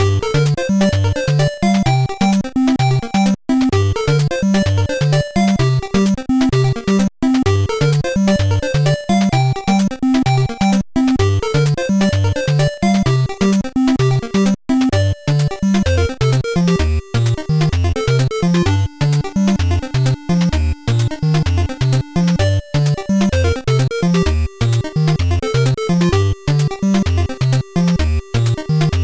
dungeon theme; Grawl Rimo; startropics (nes)

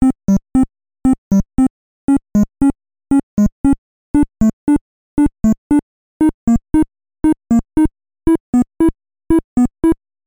Charge (Lead In)

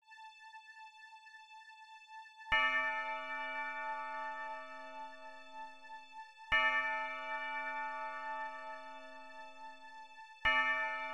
Day One Chimes

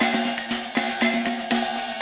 Cymbal Echo

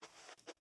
Foot Carpet Sneaker Twist; Lucius III

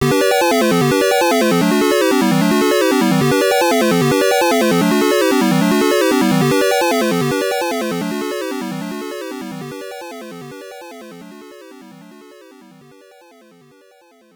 Area Clear

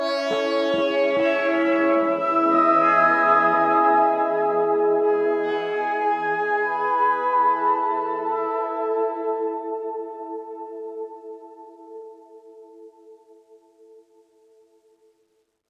Kareoke Machine Pad

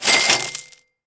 Ui Gen Spend Currency; Angry Birds

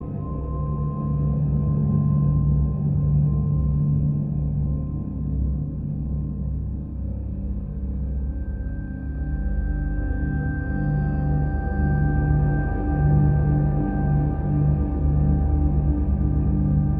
Loop Tense; the walking dead season one

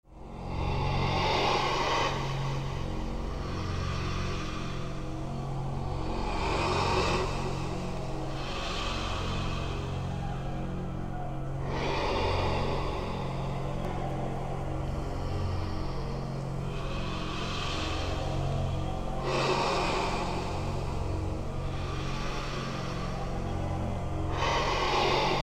Ambience; SCP-049

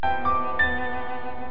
Suspense

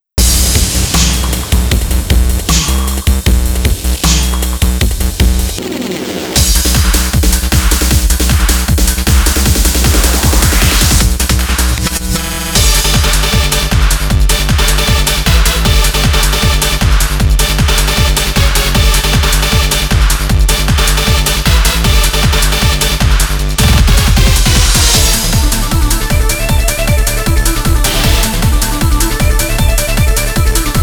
instrumental; Reflec Beat Groovin

